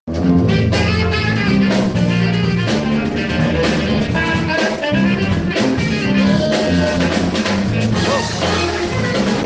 tenor sax solo

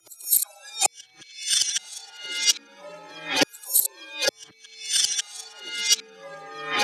perc; Pony Fan Music Remix Stems

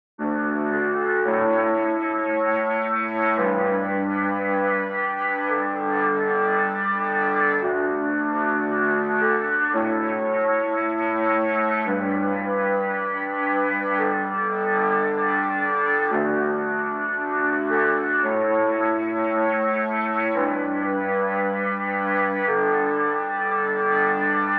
chords, 113BPM

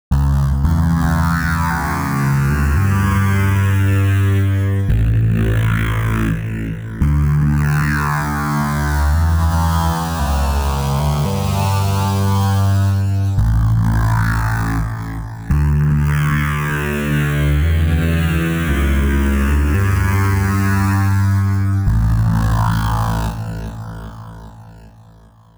weird bass, 113BPM

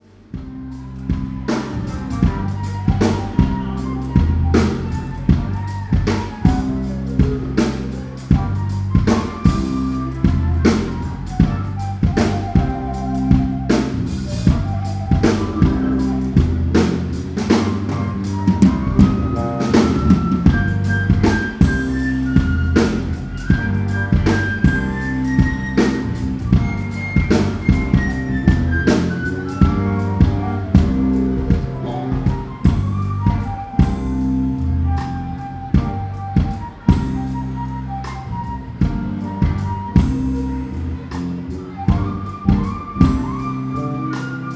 fluting it up

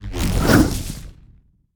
Monster; Zenless Zone Zero

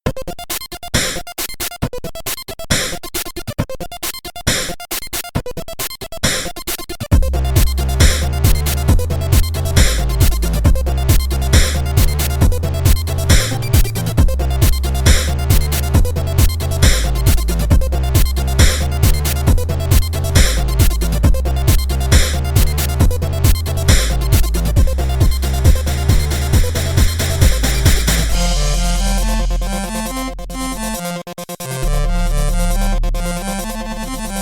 Remix; Hop Skip Jump Instant

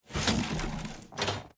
Monster; Zenless Zone Zero

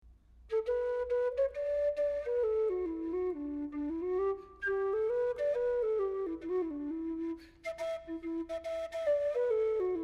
lark in the morning on low and tin wistle

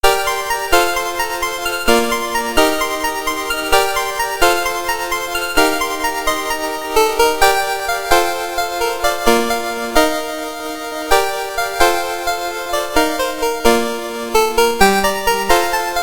Chiptune

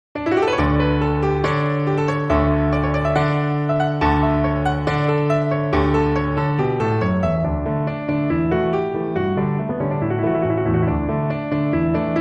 (Piano)

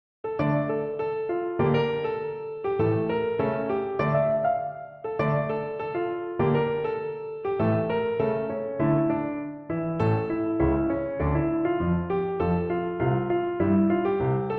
Piano

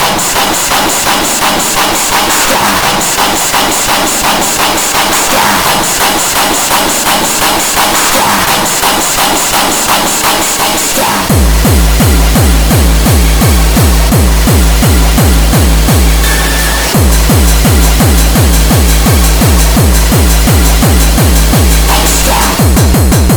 SUPERSAW HOOVER, SCHRANZ REMIX

